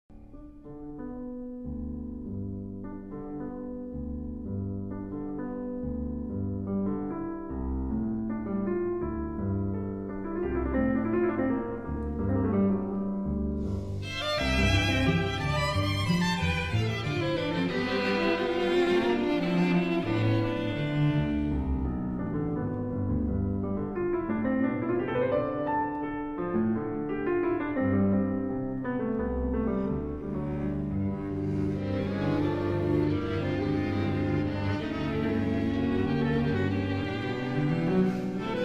Piano Quintet Finale Allegretto

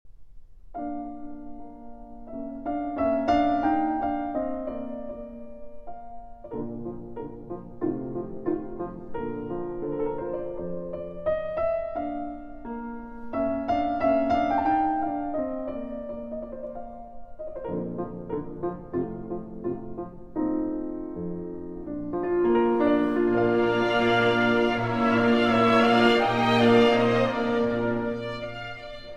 Piano Concerto No. 20 in D Minor, Romance

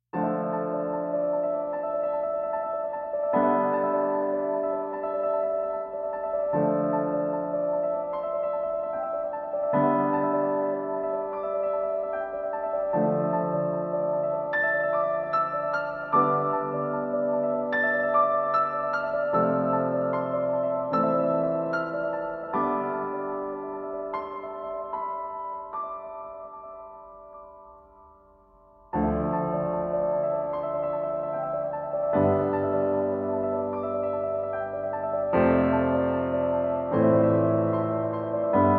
Piano Only

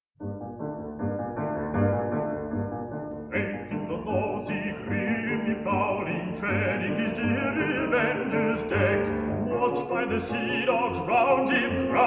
Male Voice Choir; Climax Male Voice Choir; Songs of the Sea, Op.91

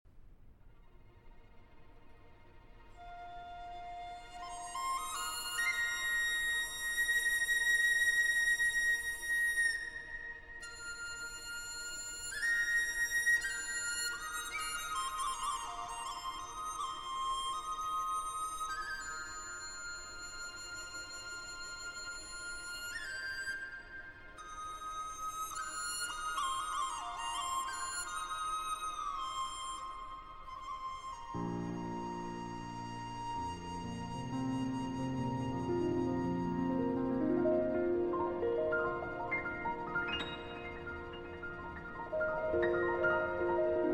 Concerto For Piano & Orchestra

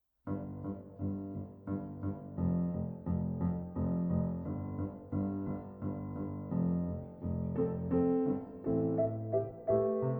Pantomime for Piano

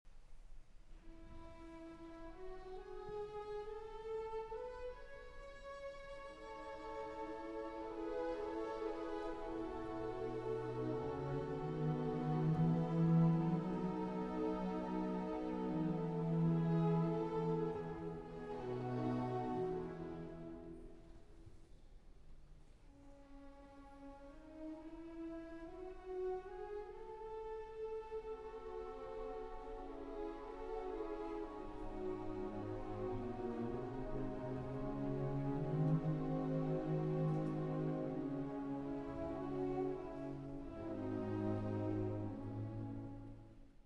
Piano Concerto in E Minor II. Romance. Larghetto

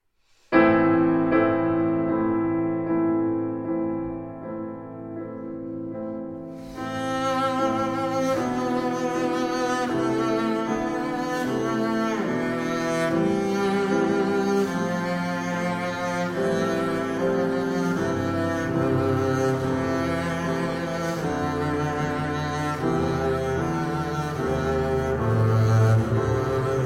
Double Bass and Piano; Élégie, Op. 24

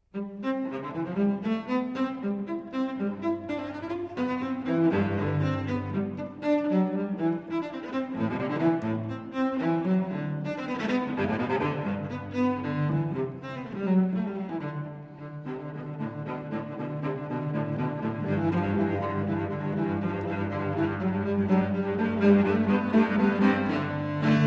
Unaccompanied Cello Suite C Major Gigue; BWV 1009

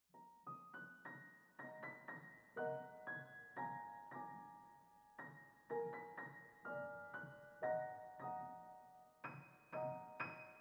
Polka, for Piano

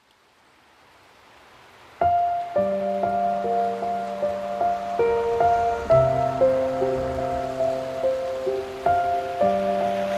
Piano and Rain

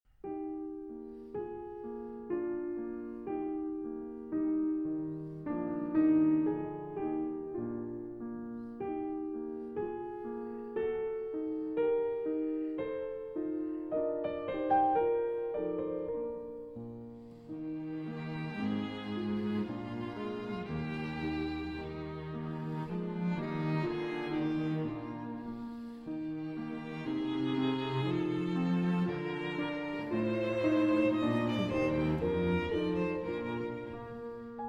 Piano Trio No. 3 in C Minor

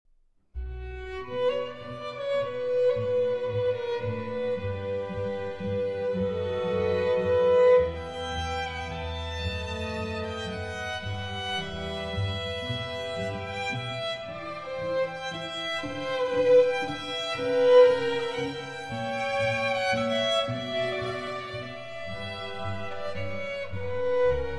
Arie. Erbarme Dich (Instrumental Version); Bach, JS Matthäus-Passion, BWV 244

